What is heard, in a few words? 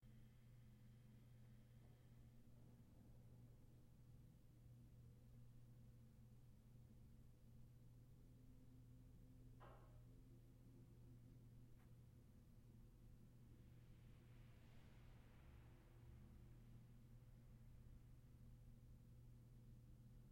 Ballroom Roomtone; blindside